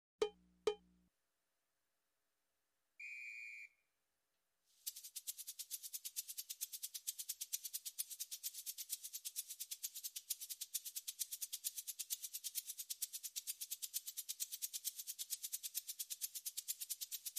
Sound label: Carnival Loop